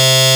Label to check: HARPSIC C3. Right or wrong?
Right